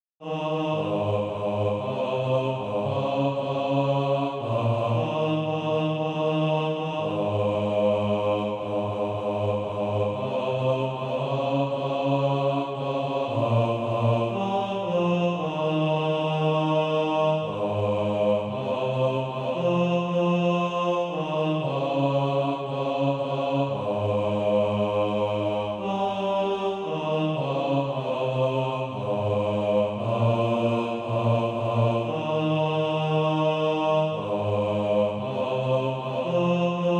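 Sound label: bass, Practise Music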